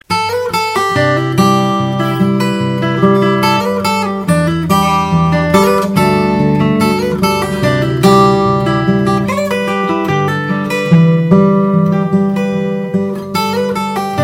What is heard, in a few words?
GUITAR RINGTONE